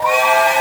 drum slider whistle; osu

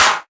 Clap; osu

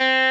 Arp.

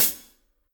closed hi-hat; osu

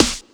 Snare Hat

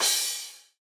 cymbal

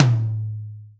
drum hit clap

